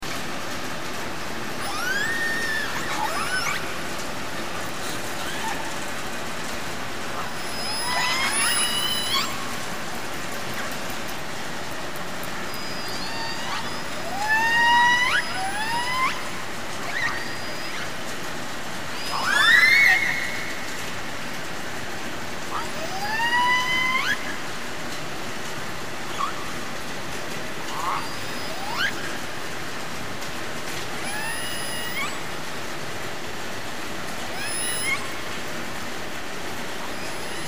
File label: killer whales; JASCO AMAR on Venus